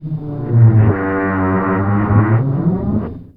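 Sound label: METAL MOAN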